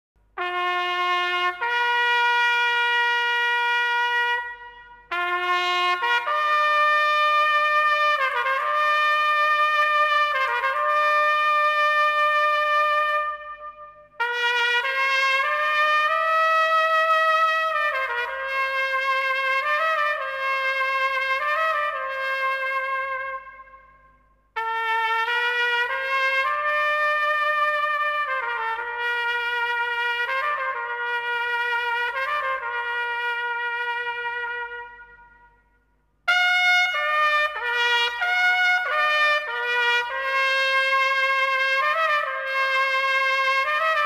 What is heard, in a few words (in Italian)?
Silenzio Fuori Ordinanza, squilli di tromba in caserma